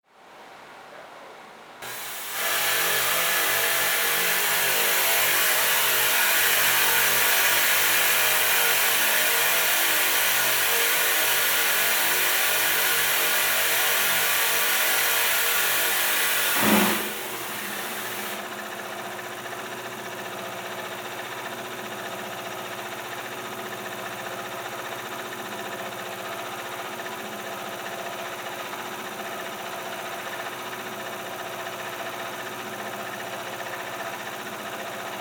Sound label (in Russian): shum raboti shvejnoj fabriki